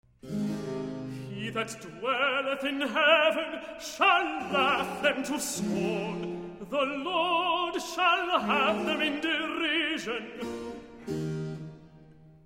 Tenor Recitative, Handel Messiah; Part II - He that dwelleth in heaven; Boston Baroque

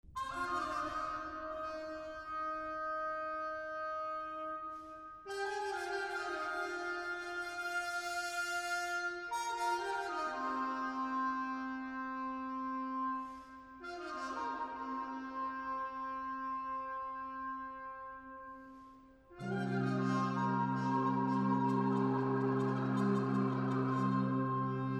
Instrumental, Brass Ensembles

